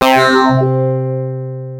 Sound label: MICRO WAVE, wave; Rubber Chicken Software Sample Bank